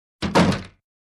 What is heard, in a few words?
Wooden Door close